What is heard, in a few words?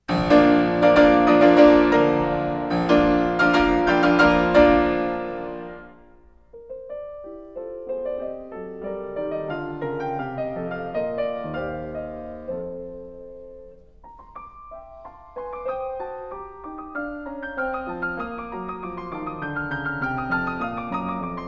Piano Sonata in B flat